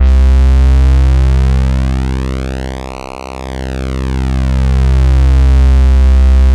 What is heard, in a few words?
SAW C1; Best Service Alpha Dance vol.2